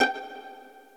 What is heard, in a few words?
P ENSMB G5, STEREO PIZZ; Greytsounds VOL-1; STEREO PIZZ2